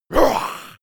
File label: beast growl high, comedy cartoon; soundpool